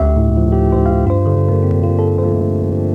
Electric Piano, Loops, Keys

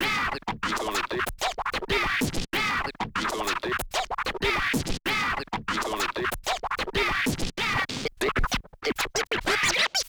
Turntables

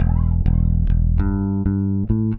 Electric Bass; Basses

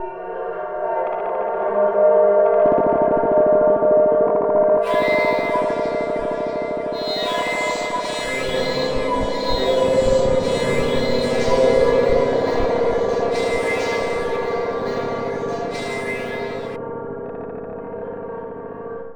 Soundscape; FX

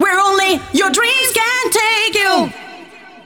vocals; SoundFactory; SMPMANIA

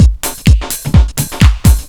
LOOP; SoundFactory; DRUMLOOP

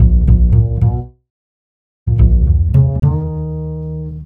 Acoustic Bass, Loops; ACID; Basses

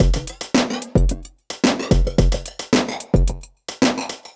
Electronica Drumloop; Drums

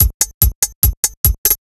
Dance Drumloop; Drums; Dance Beats

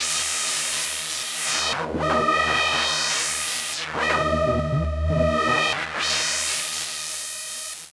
Pad, Synthesizers

